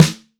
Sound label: verby acoustic snare d sharp key; Duration 0:01